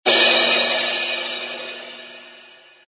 cymbal crash

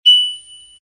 bell high single ding

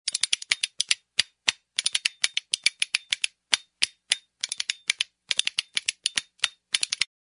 rhythm spoon slow

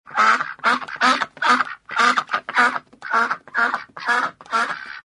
duck honking quacks

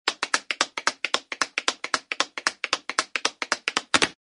tap dance intro

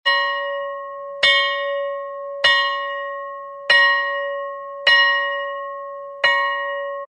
bells bell schoolhouse ring

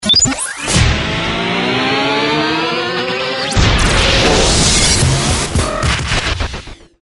Sci Fi Alert

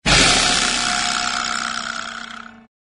comedy vibracrash

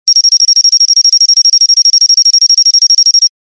timer fast signal

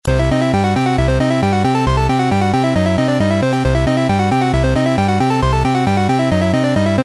merry chase

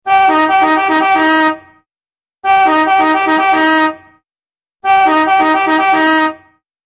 Old Car Horn Alarm

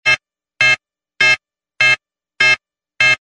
beep electronic alarm

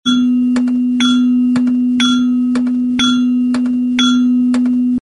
ring switchboard vintage chime

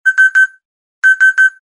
beep high triple signal

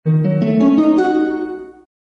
harp ascending low plucks

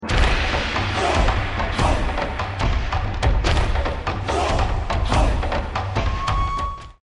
World Fusion Ringtone Africa

